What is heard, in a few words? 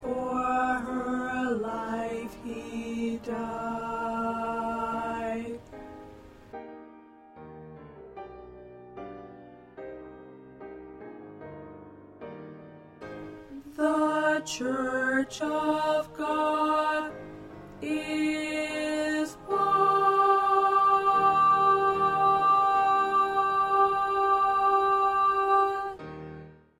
Alto 2